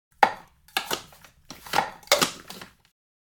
Kitchen blender mixer food processor place the ingredients inside